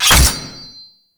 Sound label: Crafting Equip; Cetus